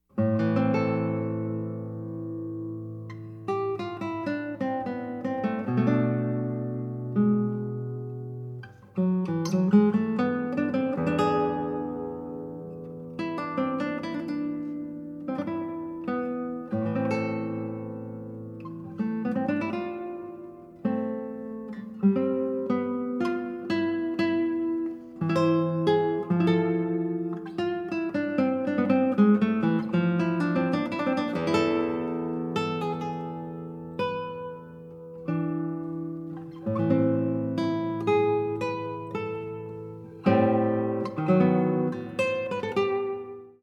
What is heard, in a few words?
Adagio and Fugue in A Minor, Adagio; J. S. Bach